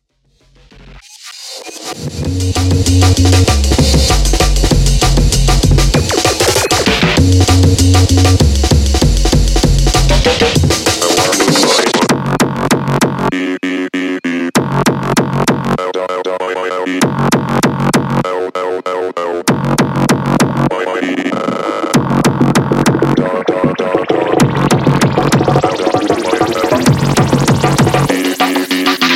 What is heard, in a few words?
fight music remix